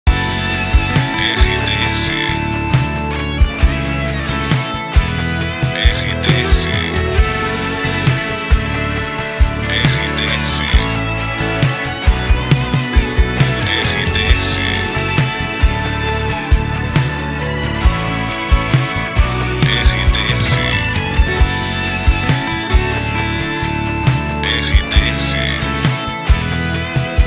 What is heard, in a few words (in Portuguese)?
trilha para locução; Rádio RBC